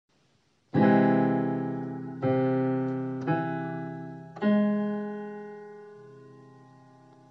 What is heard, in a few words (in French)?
do aug